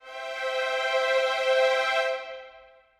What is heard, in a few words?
c maj chord